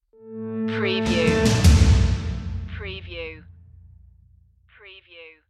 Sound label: falling down stinger